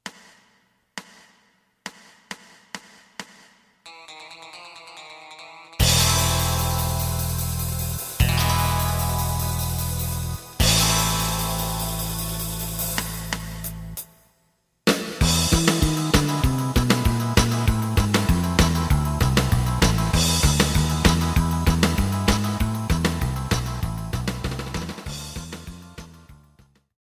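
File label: lead guitar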